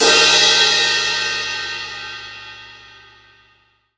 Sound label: Crash Long; pitchglitch